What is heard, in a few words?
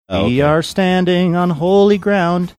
singing we are standing on holy ground